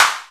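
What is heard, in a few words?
SP-12, drum machine; Best Service Gigapack 2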